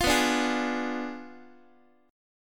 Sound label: B Diminished 7th B down Guitar; Bdim7 strummed; x,x,8,9,6,7